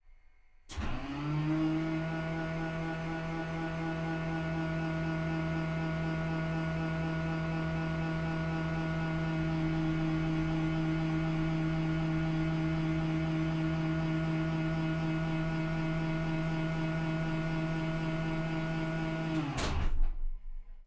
Boeing B17 Bomb Bay Doors Close Interior Nose Left; B-17G 1945; DPA4061; Pole Position